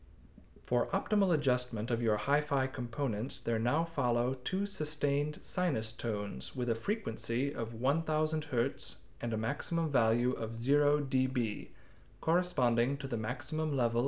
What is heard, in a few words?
Test Signal